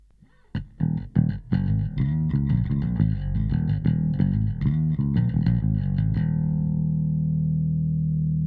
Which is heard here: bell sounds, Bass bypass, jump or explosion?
Bass bypass